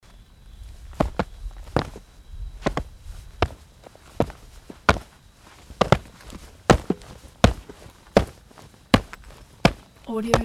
stomping on concrete floor with nature background

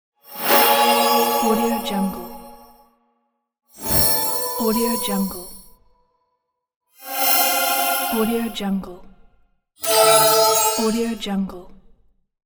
healing

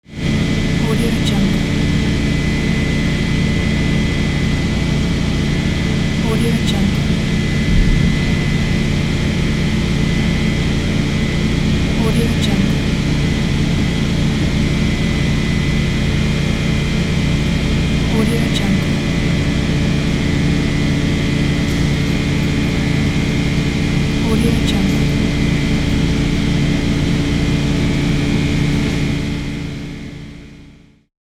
aircraft carrier ambience

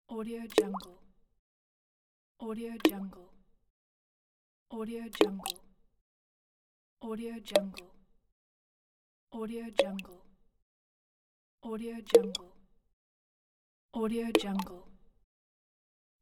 water drops; warpjumper

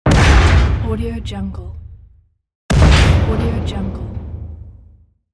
cinematic explosion

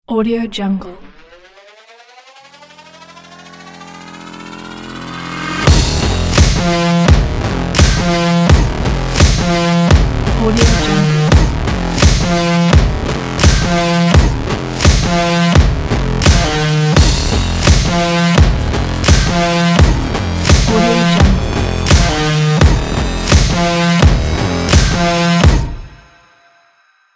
dirty stylish electro sport beat

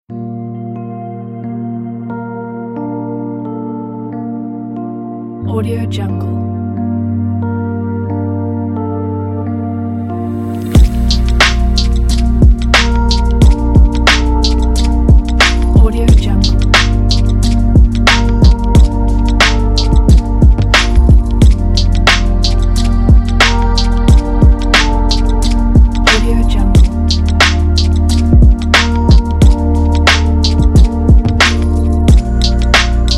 warm hiphop

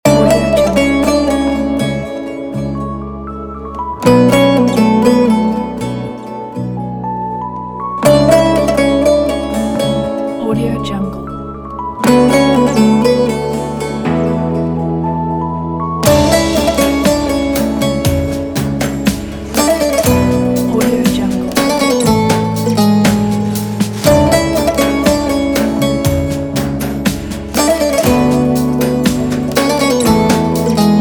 modern anatolian turkish dramatic midtempo; planetwaves

